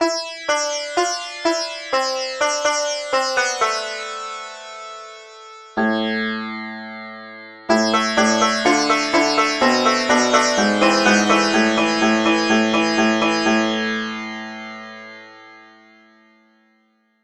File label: sitar